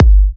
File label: G, kicks; markus hakala kicks